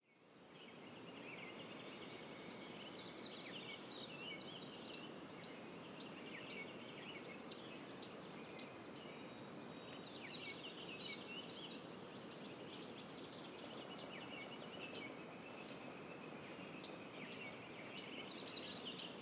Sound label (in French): bruant à gorge blanche cris pink; lac france